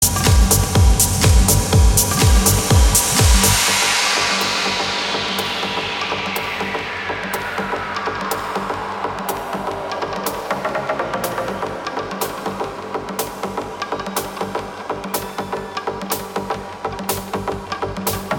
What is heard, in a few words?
House Jam